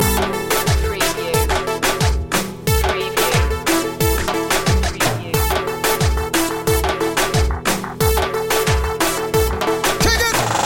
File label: splanglish reaggaeton loop